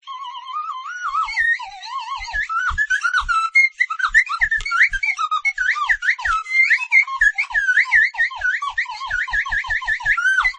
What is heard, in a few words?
Circus Room Juggling